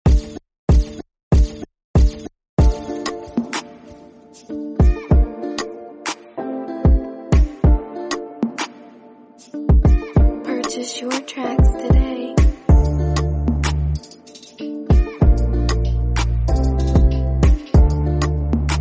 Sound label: Afrobeat Instrumental